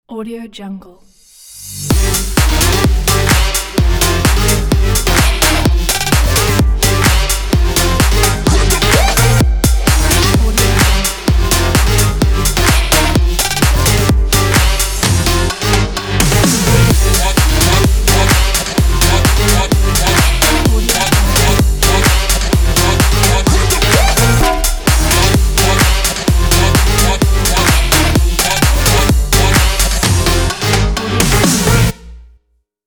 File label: energetic future house